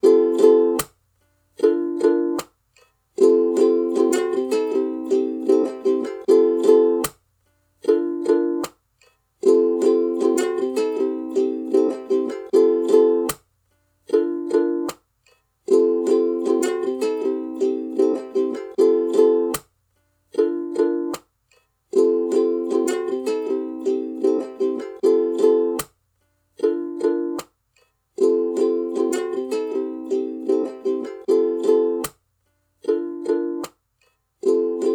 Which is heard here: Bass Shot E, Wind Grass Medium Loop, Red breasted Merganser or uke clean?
uke clean